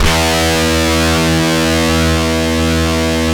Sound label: rock E1, rock guitar; InVision Interactive; Lightware VOL-1; Instruments & Percussions